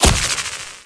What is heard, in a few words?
Hit